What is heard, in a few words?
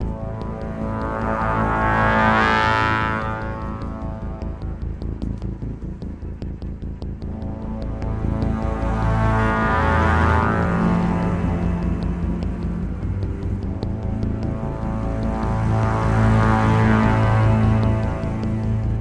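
music, horns synth loop; transformers war for cybertron gamerip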